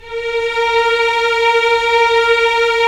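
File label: Strings Violins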